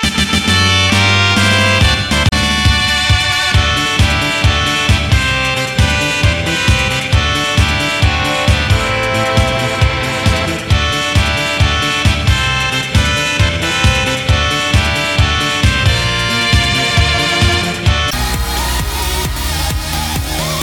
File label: Victory Fanfare